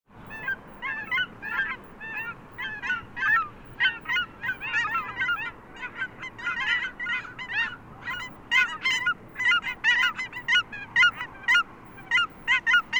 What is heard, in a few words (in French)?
bernache de hutchins, chant